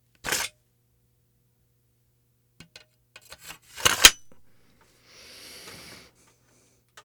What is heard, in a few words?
sword